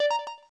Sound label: Side volume, KeyTone; LG; T500 Ego